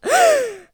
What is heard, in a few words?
Child Frightened